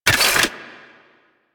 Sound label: Mech End; DE; Warframe; Weapons; Archwing; Arch Anti Aircraft Gun; Kuva Grattler